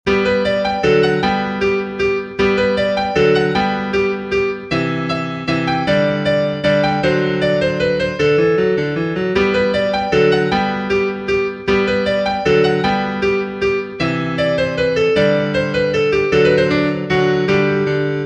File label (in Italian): Minuetto; BWV 116